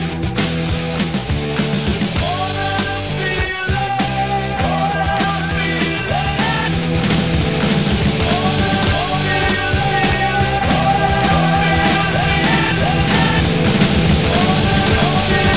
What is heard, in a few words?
RINGTONE